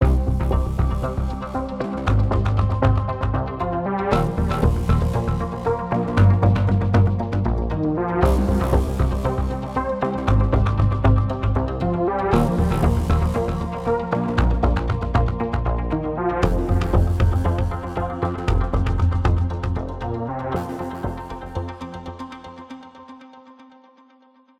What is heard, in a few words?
Prize Tonal Rhythm Loop